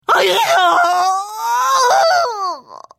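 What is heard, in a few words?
Hit, Khajiit female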